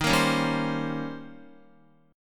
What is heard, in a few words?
Eb 13th, Guitar; Eb13 strummed; JGuitar